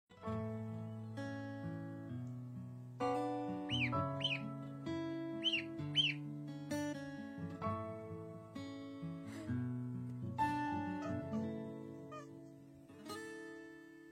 Kind mit Ente

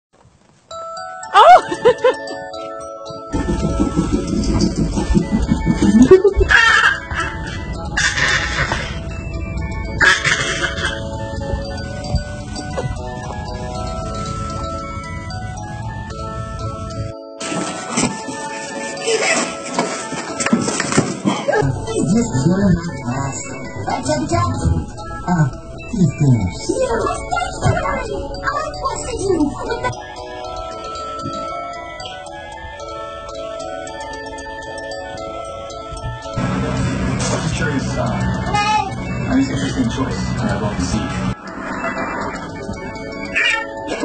Katzen

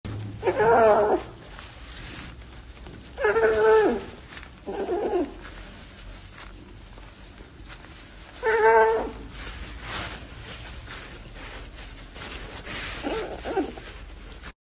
Katze mit Maiskolben

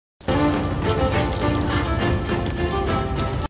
Nichts als Papageien

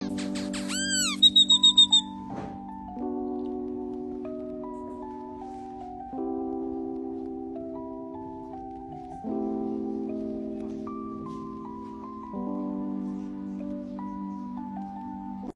Singvogel

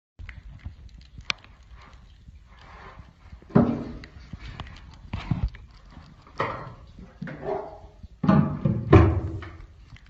Kleiner Igel

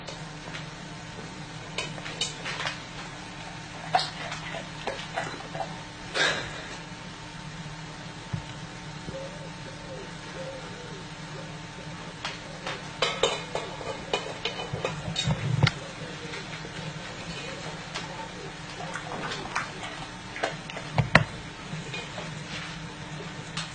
Papagei füttert Hund

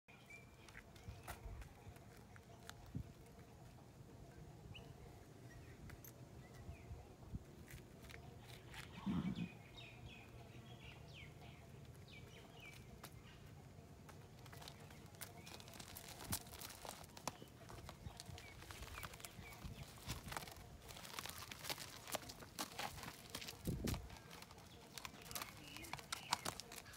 Kind mit Pferd

